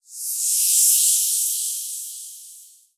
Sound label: Stage Clear